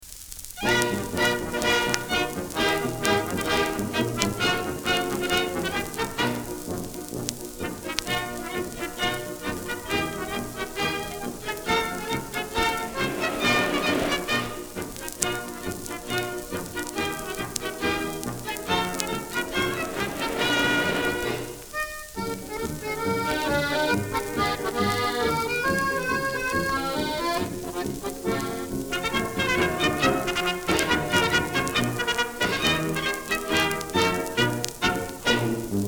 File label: Polyphon